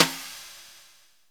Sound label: Brush, Roland R-8